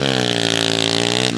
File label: power, Engine